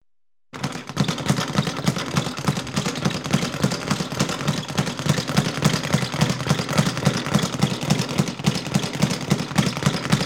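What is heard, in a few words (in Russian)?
бокс боксерская груша маленькая серия быстрых ударов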